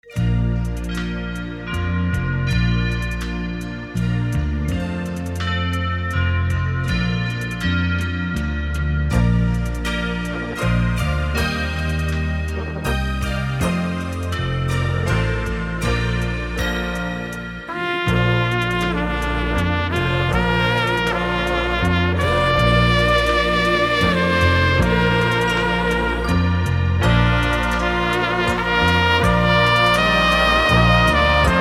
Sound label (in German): trompetenhits